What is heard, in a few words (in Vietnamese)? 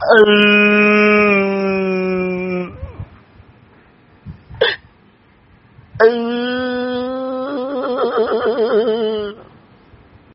am thanh khoc tieng man; tiktok meme